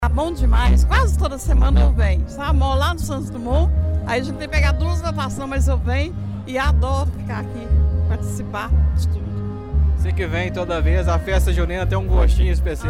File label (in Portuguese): forro